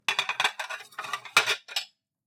Kitchen, Sink, Plates Stack In Drainer; UberDuo; The Kitchen Sink Audio Playset

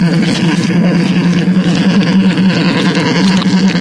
fast zombie tremble loop; hl2

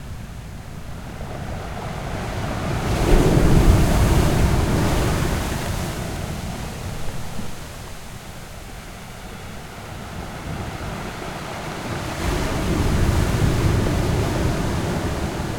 Ocean Medium to Large Rocks, Cascadia Shore Waves; Nick McMahan

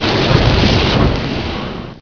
flame burst, fire; hl2

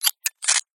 Micro UI Readout Sound Mechanical; Effectsworks; Tech Future

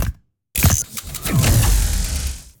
Robotic Lifeforms 2 - Power - Autobot Disengage; SoundMorph

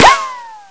ric, weapons; hl2

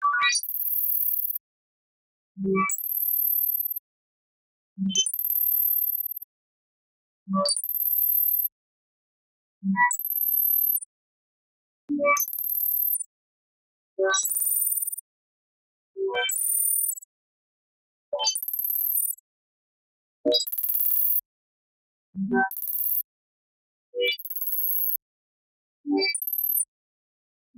Confirm Access Granted, Futuristic Interface; Justsoundeffects

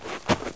softshoe generic, footsteps

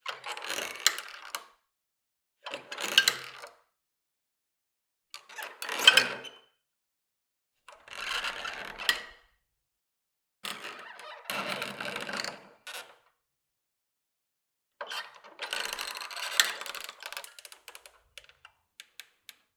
OLD STUFF Doorknob Creaking

